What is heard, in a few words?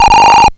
SKID; Sonic R